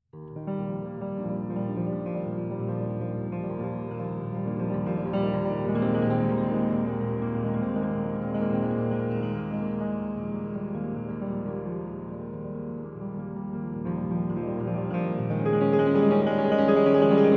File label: Piano Solo